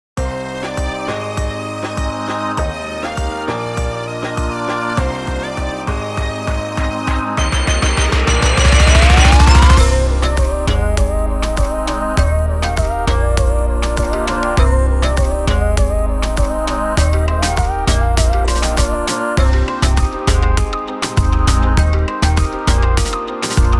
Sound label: INSPIRATIONAL REGGAETON